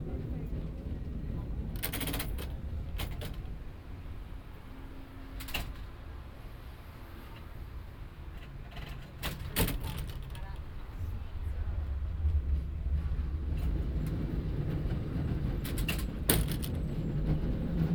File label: Cable Car Miscellaneous Noises; San Francisco